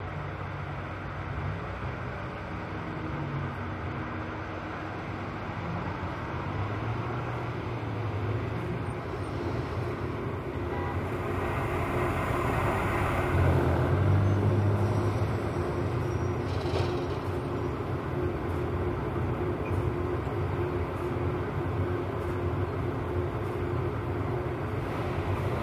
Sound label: city night lo lp; The Sims 3; windows gamerip 2009